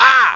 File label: oooww